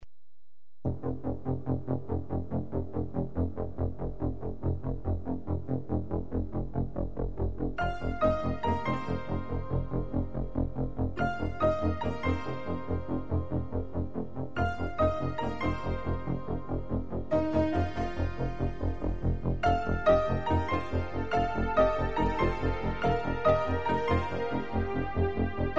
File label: instrumental; Dolphin